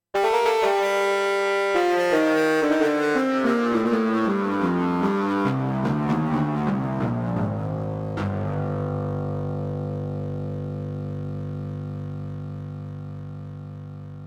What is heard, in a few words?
lead hard